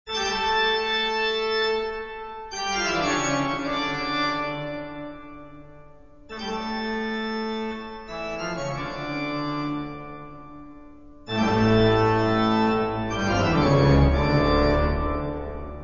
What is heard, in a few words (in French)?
jingle toccata